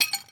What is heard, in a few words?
Physics Object Wrench Impact